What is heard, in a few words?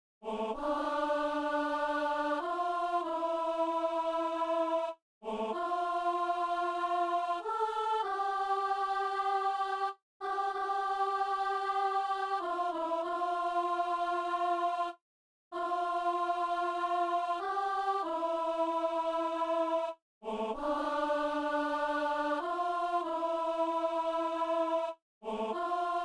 soprano; Messe du 17 janvier à Saint-Denis